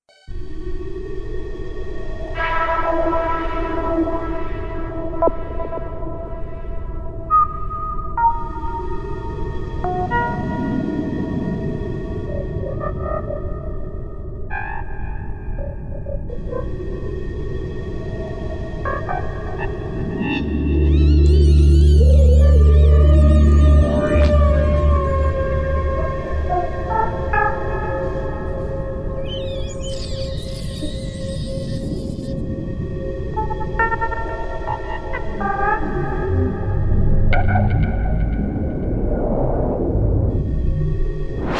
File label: Atmosphere Alien